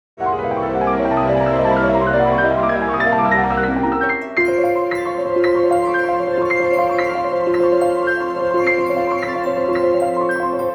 Fantastic jingle; Jingles medley

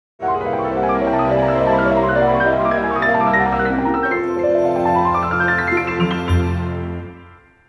Fantastic link, jingle; Jingles medley

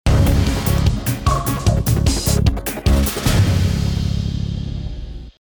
Agent secret link; Jingles medley

